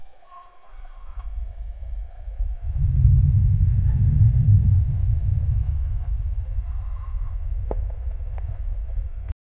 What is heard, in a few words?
volcano erupting with lava flow